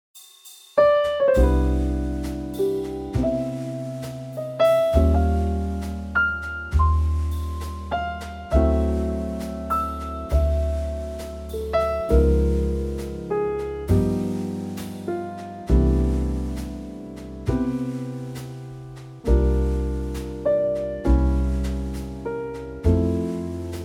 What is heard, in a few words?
C trio